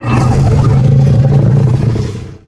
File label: trike voc mumble